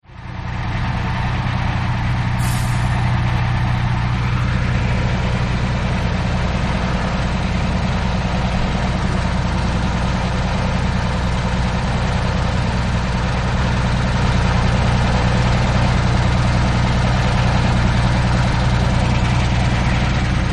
Bus Engine Idle Purr For About 20 Seconds Then Drives Off, Exterior Perspective; Busses; Michael Huang